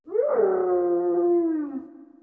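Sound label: random hyena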